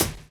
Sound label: DRUM CHOP; AMG Gota Yashiki Groove Activator